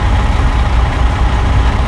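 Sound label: buzzer industrial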